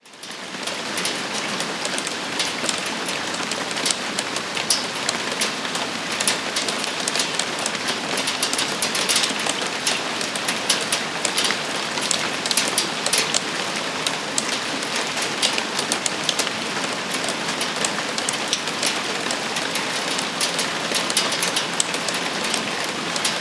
Heavy Rain Backyard City

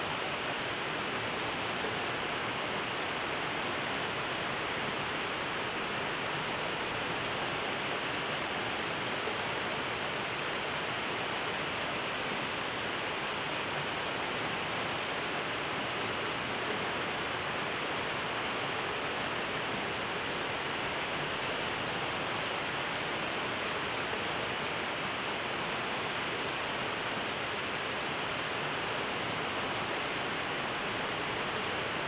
Oklahoma City Rainy Night Sounds ASMR

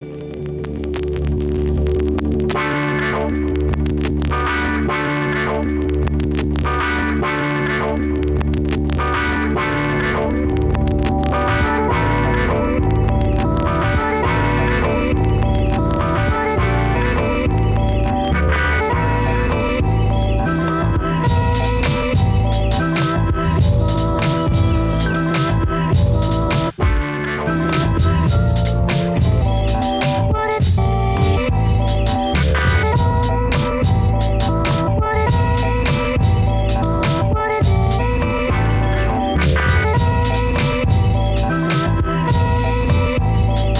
summer beat

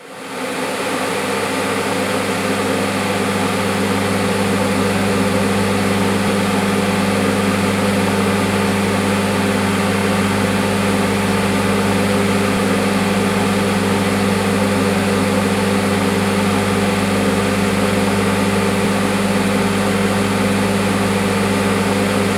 White Noise Microwaves Kettle

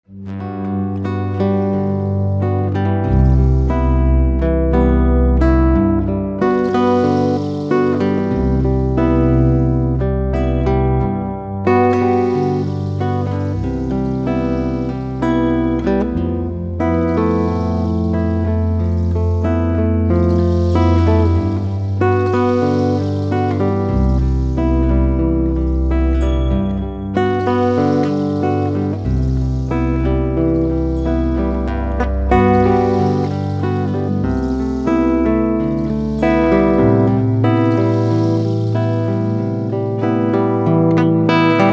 Sleeping Music for Guitar

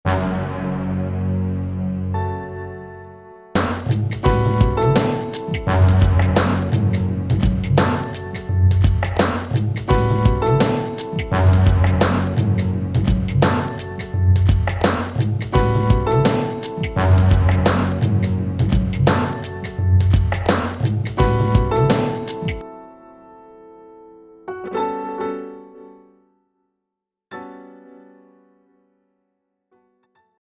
beat

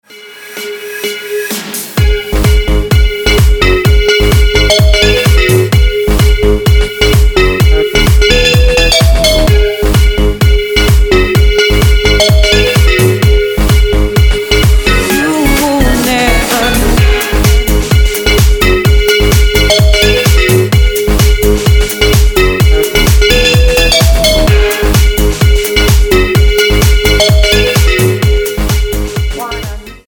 House Ringtone

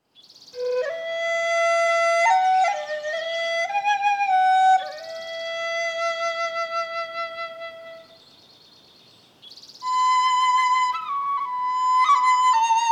Relaxing Music, Eastern Relaxation

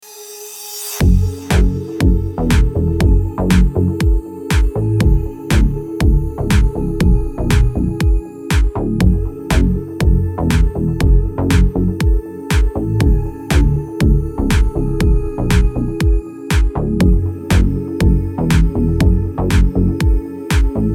Deep Sound Remix

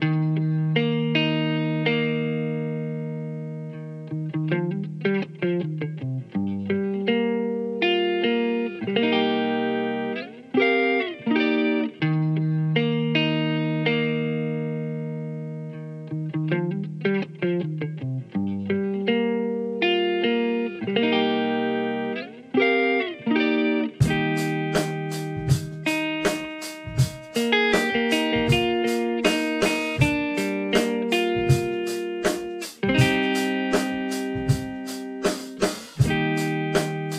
guitar

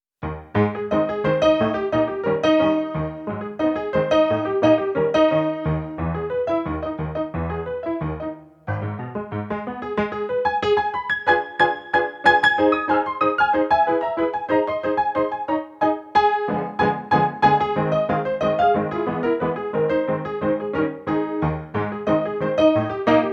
Maple Leaf Rag

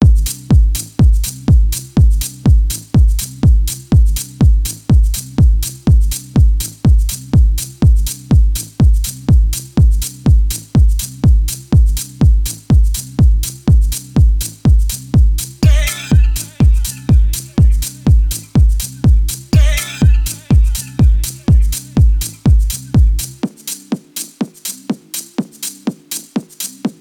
Ocean Groove

